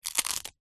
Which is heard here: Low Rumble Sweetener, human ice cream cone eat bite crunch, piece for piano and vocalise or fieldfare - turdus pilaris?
human ice cream cone eat bite crunch